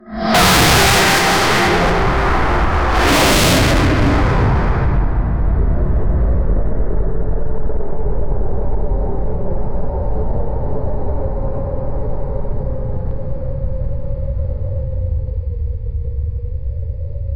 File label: the air slowly tightens, roar